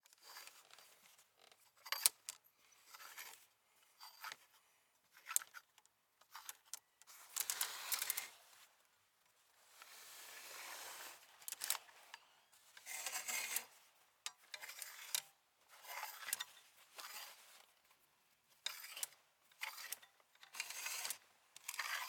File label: Hangers Sliding In Closet